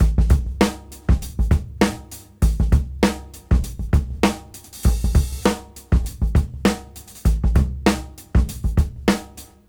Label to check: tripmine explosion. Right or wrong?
Wrong